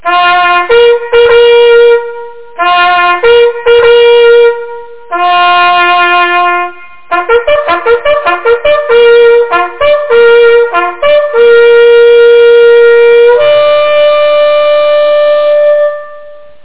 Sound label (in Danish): hyldest til graevlingen, jagthorn; Askov Malt Jagtforening